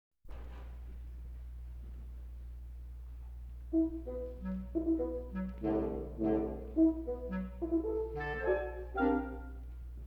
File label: Woodwind Quintet